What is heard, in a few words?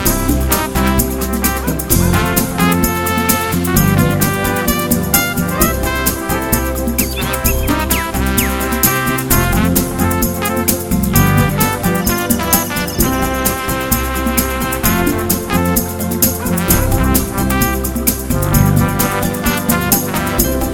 A Beautiful Beat